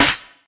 steel snare drum c sharp key, Royality free steel snare drum tuned to the C# note; snare drum samples